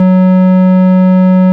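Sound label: ORG, Farfisa organ; Roland LCDP09 Keys of the 60s and 70s 1; Farfisa Clar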